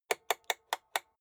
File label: rotary control slow turn hard